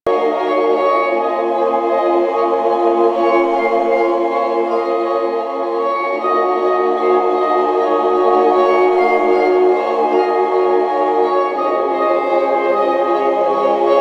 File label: Moon Opera House Music; Ambience; Warframe; Orokin Moon